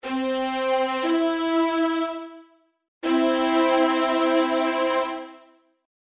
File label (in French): 3ce MAJ